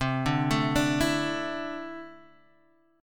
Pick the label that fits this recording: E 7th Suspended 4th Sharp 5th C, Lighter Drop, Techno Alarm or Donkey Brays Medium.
E 7th Suspended 4th Sharp 5th C